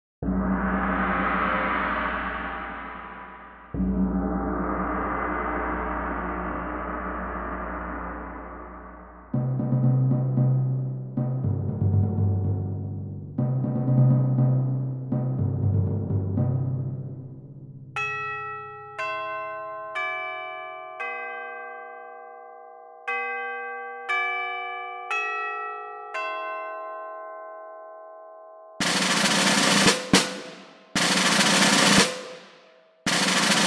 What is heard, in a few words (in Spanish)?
percusión; las familias de instrumentos